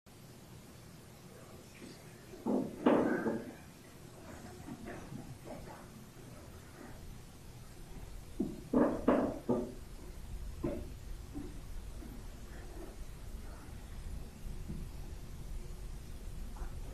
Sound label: footsteps; sturges paranormal